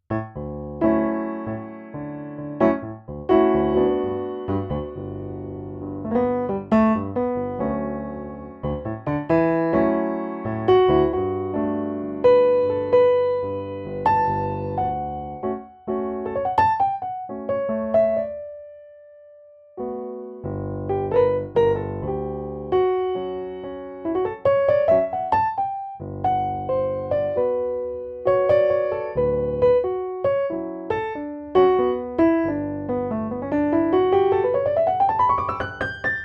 Nice Piano Headphones

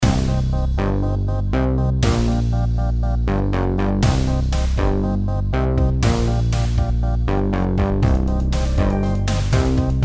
Distortion Fun

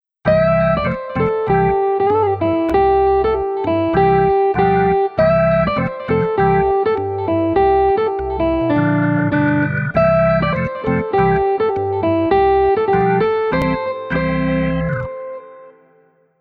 Short Dance Tune, Amplified Nylon Guitar; Organteq; Pianoteq; Modartt

